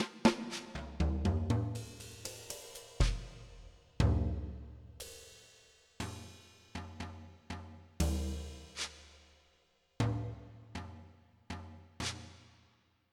Mini Kit

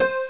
metal harp, synths; Zoom - Release 2 (1996); Active Software

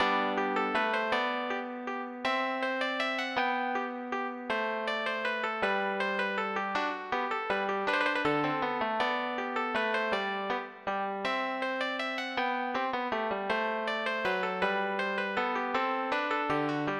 minuet

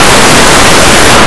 blot; Blue Heat

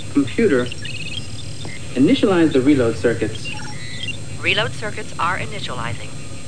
reload circuit; Utilities Professional

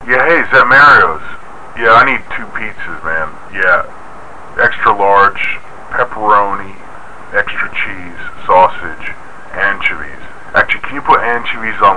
phonecall

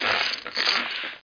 wipe

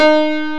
ac pian

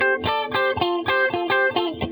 Happy Funk Dm, Guitars; ACID MUSIC

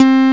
Synbass; gmplay

